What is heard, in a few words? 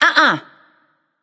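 disagree, female; smite; voicepack; hera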